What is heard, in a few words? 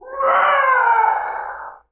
death; cursedtemple